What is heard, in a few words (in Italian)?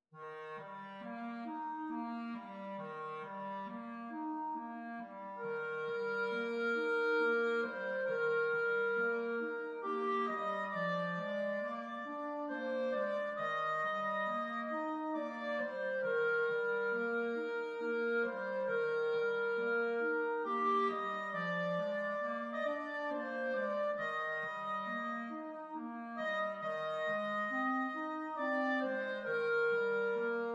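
Barcarola, clarinetti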